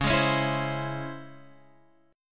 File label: Db Minor Major 7th, DbmM7 chord; DbmM7 strummed; JGuitar